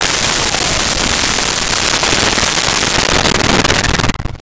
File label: Space Ship Fly By, Science Fiction